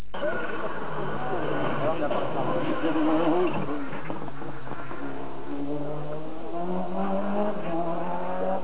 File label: impreza, Impreza WRC; Impreza WRC de gerome Galpin